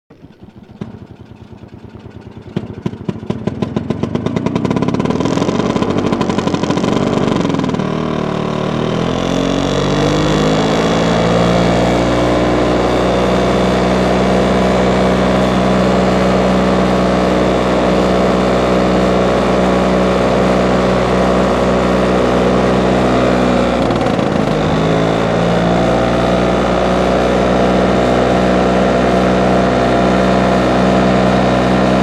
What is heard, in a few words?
Mini Hovercraft Star, hovercrafts